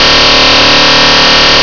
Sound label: pulse